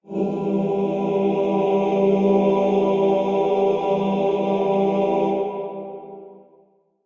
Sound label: Kuva Lich Requiem Mod Choir G